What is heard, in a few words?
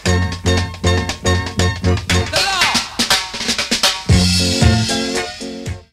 Drum fill